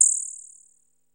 GUIRO; DRUMS & PERC

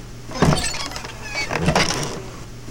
swinging door; E-MU Producer Series Vol. 3 – Hollywood Sound Effects; Human & Animal

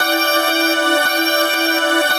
RINSING, Pads; Distored Reality 1&2

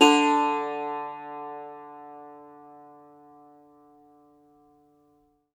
Cumbus Saz

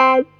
HEY, Roots Disco; USB Soundscan vol.32; AKAI

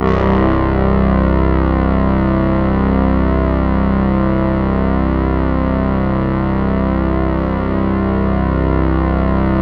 C1, Synth Line; 90 s Sample CDs; NiceBeats; SynthLine VOL-26

